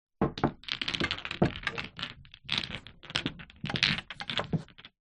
sport snooker pool junior balls move around table by hand